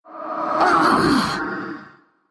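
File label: pain, Russian; dota2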